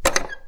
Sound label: Furniture